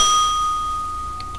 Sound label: bingg; MacTykoon (Economics Game); MacTykoon 1.1.3